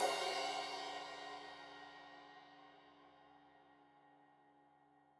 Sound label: Crash 15in L edge, Cymbals Stereo